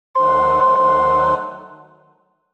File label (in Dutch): alt, koor; Bach (Johann Sebastiaan); BWV 244 Matthäus Passion